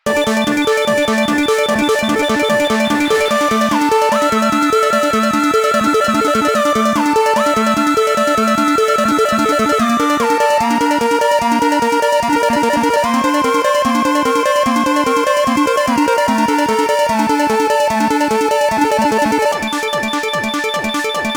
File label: Snake Game Music Loop; Warframe; Nineteeen Ninety Nine